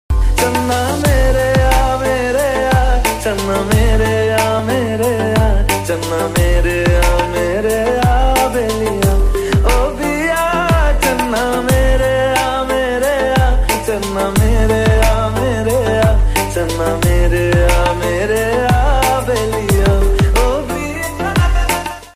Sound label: lofi mix, hindi ringtone